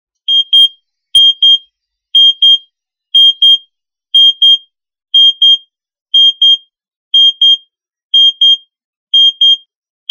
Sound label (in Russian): Морзе 'М'